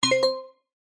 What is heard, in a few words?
game tone synth bright organ style short stab alert